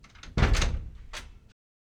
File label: Door Close